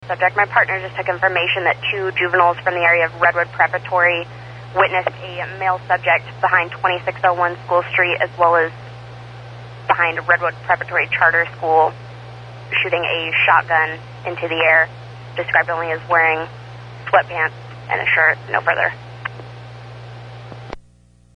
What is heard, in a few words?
shots fired